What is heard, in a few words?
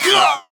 Death; Paladins; Bomb King